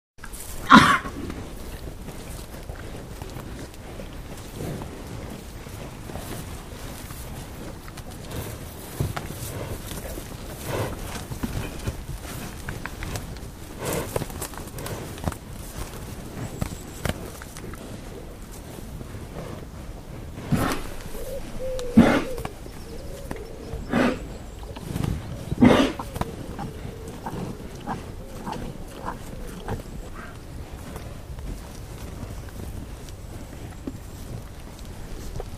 Coughing Cows; Cows Milling In Field And Coughing - Occaisional Dove Cooing; cattle